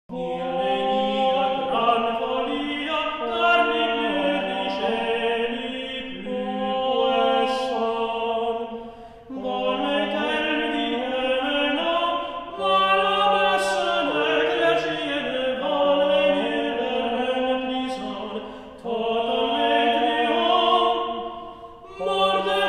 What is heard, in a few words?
Motets on tenor; Dominus; Fole acostumance